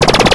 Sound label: pulse gun; HAVOC; RB Sounds